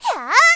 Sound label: attack; Eternal Return; Tia; Voice Line; KOR